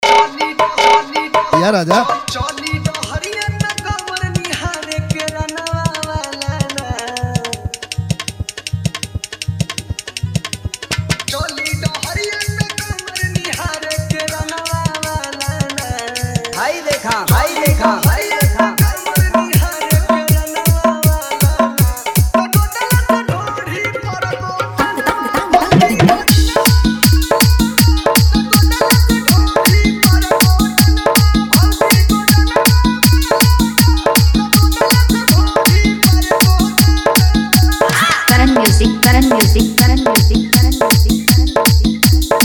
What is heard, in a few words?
Hard Bass; Ekdam New Mixing Jhan Jhan Bass